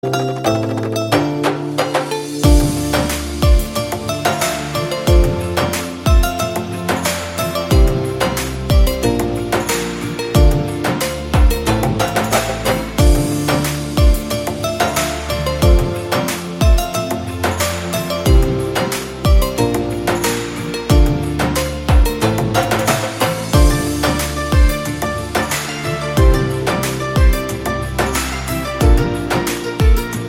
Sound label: Instrumental